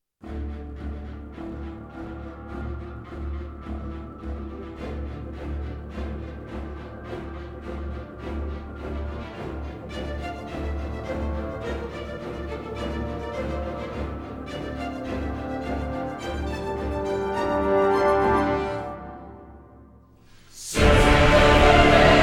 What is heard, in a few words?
Into The Eternal Twilight, Orchestra And Choir Only; трейлерная музыка